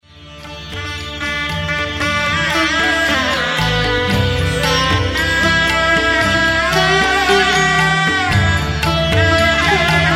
RGHUPATI RAGHAV RAJA RAM MUSIC

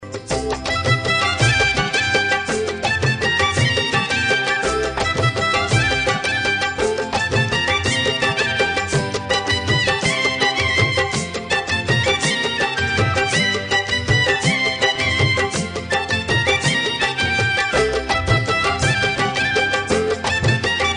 music

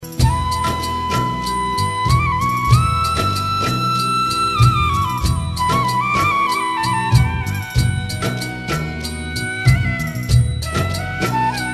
music

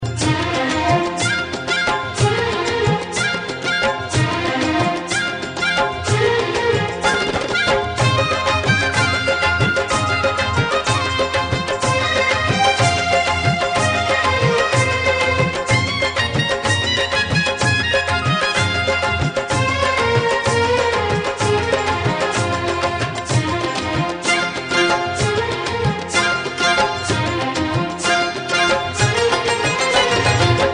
music

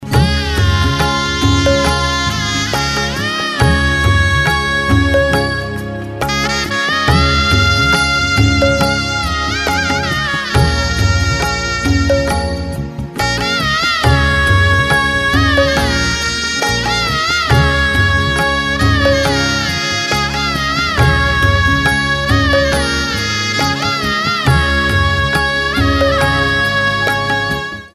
music

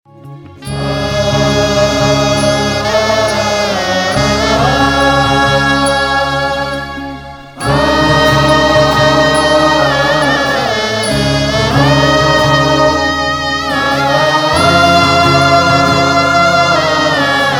swami dhun music

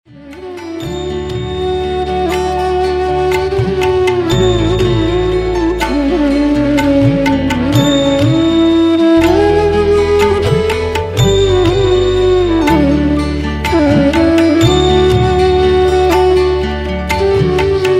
SHREE RAM CHANDRA KRUPALU MUSIC

